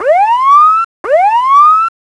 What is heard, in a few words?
Rising siren